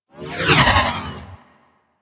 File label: Ball Projectile By; Warframe; Missions; Tenno Ball